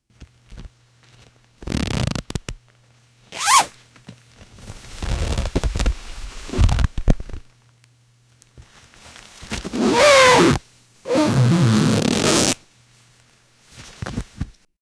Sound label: sound of a person rapidly, fabric